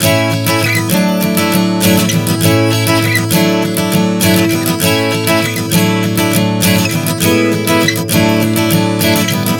Progression 100 A-D-E-D, Acoustic Guitar, Chord Progressions 100 bpm